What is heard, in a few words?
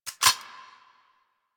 Double Barrel Shotgun Mech; Warframe; Tenno